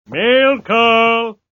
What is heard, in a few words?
Mail